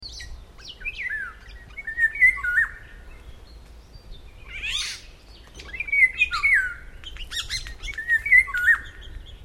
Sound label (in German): pirol